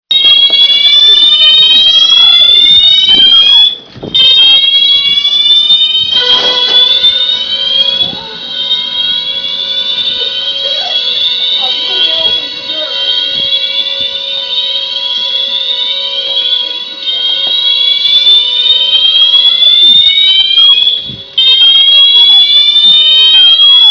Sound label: fire Alarm